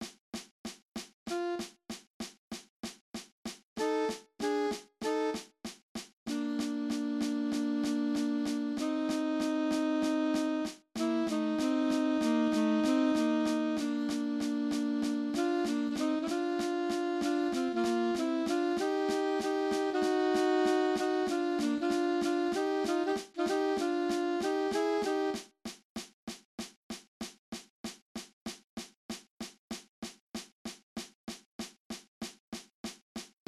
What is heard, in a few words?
alto sax, cut time